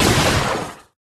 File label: shock; scmod; ut99